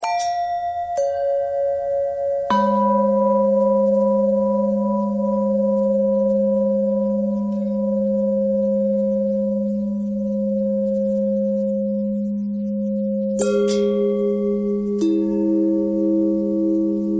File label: long bell bend threads